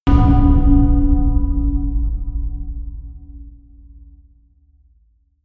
huge wooden tube, percussion

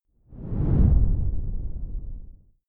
whoosh simple low grainy; swooshes-swishes

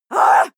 female shout angry; schreie; menschen

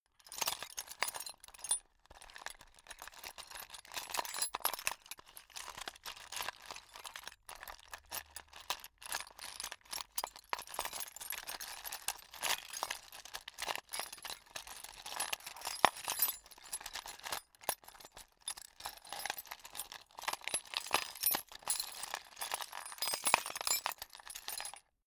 sundries rummage; soundpool

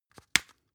spice jar open bright

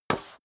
step sneaker metal dry slide short

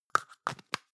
plastic can lid screw close hard long; objekte; dose

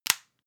floss close hard; haus-garten; badezimmer

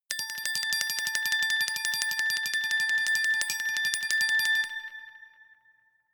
glockenspiel sparkle tremolo low octave; percussion

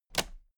toolbox latches close regular

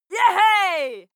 female shout yeah; menschen

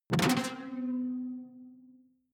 sci-fi alien bounce detuned bright; swooshes-swishes

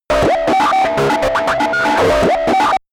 calculator intense; comedy-cartoon; soundpool

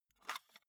cassette case open soft; objekte; kassette

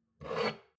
shot glass grab table slide medium; berufe; soundpool

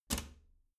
bathroom cabinet doors close soft

